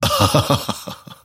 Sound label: grimstroke laugh; dota